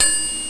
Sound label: Bong